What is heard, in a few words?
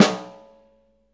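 snare drum, JAZZDRUM, left; Ueberschall; Drum & Bass Flash Trackz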